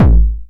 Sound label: 909 BD Tape Sat; Goldbaby Tape808 & Tape909; The Tape 909